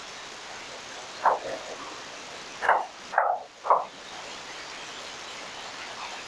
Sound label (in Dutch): klopjesreeks